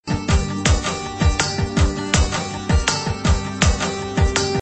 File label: Melody Tune